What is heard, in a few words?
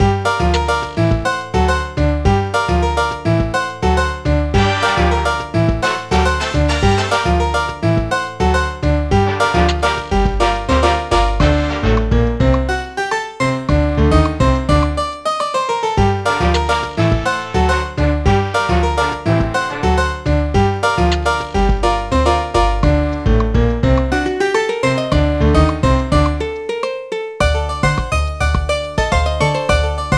Music Main Menu; Paws and Claws Pampered Pets 2; NDS